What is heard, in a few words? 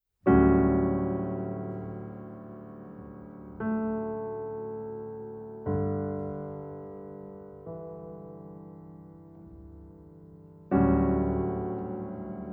Improvisation